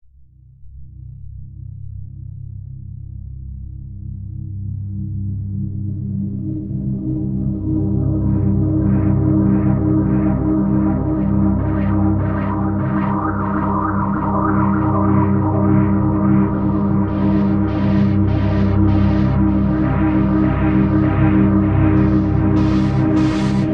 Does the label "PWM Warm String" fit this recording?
Yes